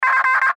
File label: Radio Morse Code; Weak Signal Letter F; Warfare; Blastwave